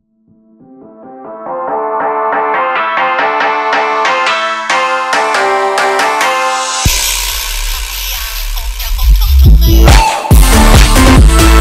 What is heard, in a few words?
china phonk